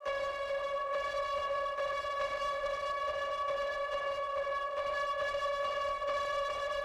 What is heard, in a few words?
Blown out Guzheng; Vignette Kit